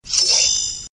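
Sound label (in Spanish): "Grito de Doublade", Pokémon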